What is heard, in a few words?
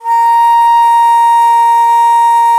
BON DI, Winds, Old World Instruments; E-MU Producer Series Vol. 7 – Old World Instruments